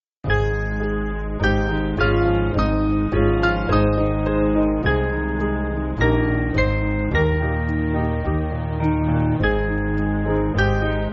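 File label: Piano, Music Backing; Here From The World; Tryst; Mainly Piano (CM)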